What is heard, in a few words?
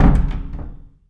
door close; Singles; French Version